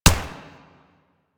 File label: Fire; Warframe; Vipers